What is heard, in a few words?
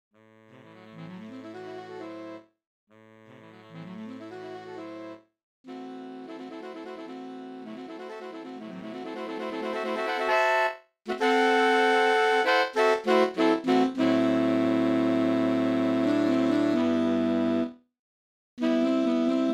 MIDI